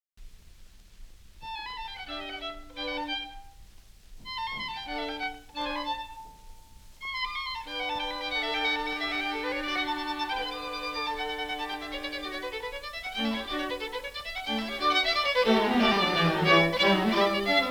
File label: bayes click mozart